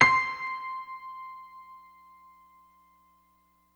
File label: Piano 2 (C; Sonic Foundry (Sony Creative Software); Ugly Remnants VOL-2